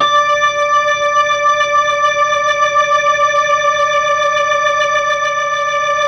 Hammond D; 3D Sound - 3D Keys VOL-1

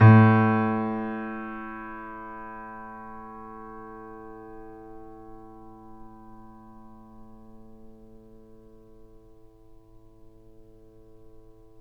Grand Piano Mp A1; AKAI S6000 CD-ROM - Volume 2; Grand Piano 256M

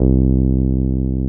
TB-303

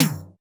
Korg 01W MegaDrums; 300 Drum Machines

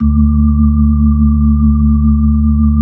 B-3 Chorus

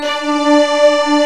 SYN BRS & CHOR

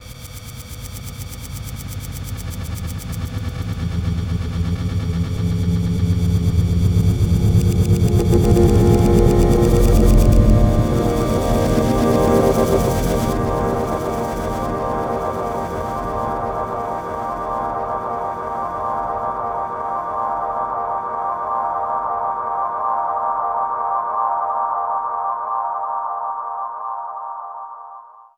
Jazz Noise; Sonic Foundry (Sony Creative Software); Leo Cavallo Bass Taster; Special Fx

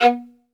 Synclavier Strings, SOLO VI; ILIO

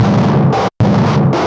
hardcore, Loops; Distored Reality

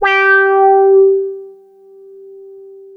G5, Boom da Bass; E-MU Producer Series Vol. 6 – Analogia Project (CD 1)

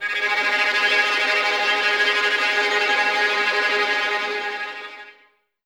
VS G#3 tremolo, Violins, String Ensembles; Miroslav Vitous; 11 Violins

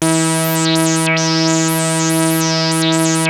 2600 E4, ARP 2600; Hollywood Edge - Giorgio Moroder Rare Synthesizer Collection

